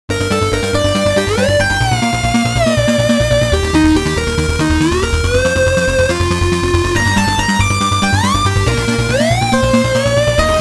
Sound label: chiptune